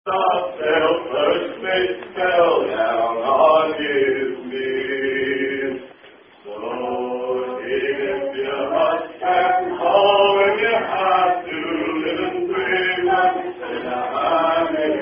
Song; Heave If You Must; SCA Song

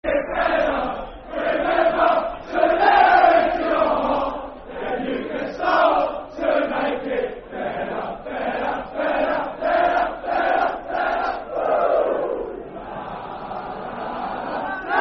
Hey Brentford football chant (soccer song)

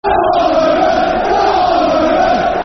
Football Chant (Soccer Songs); Bristol Rovers; fanchants-com

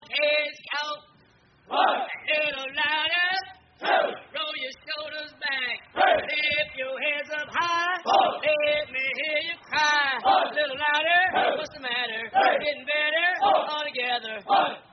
Delayed Cadence Count! (Marines); march to cadence with the us armed forces

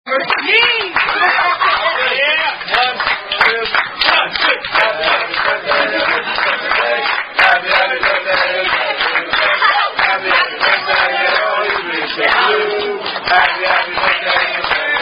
Singing Happy Bday, Happy Happy Birthday; Red Robin

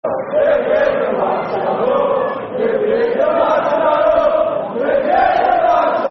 Football Chant (Soccer Song); Stand Up If You Hate The Mags; Sunderland Safc

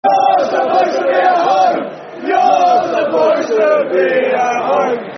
Football Chants; You're Supposed To Be At Home; Crystal Palace Cpfc

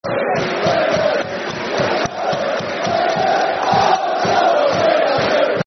Football Chant (Soccer Song); Charlton Athletic; fanchants-com

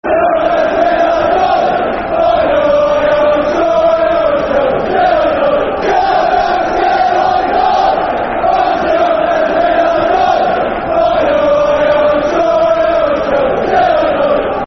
Football Chant; Cheltenham Till I Die; Cheltenham Town Ctfc

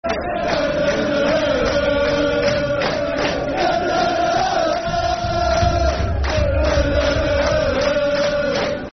Football Chant (Soccer Songs); Hereford United Hufc

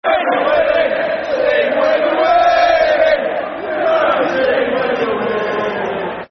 Sing When Your Winning, Football Chants; Reading; Reading Rfc

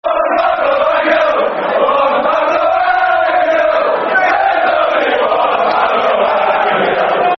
Football Chant; There's Only Juan Pablo Angel; Aston Villa Avfc

